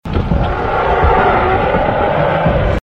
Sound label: roaring, animals; jungle animal roaring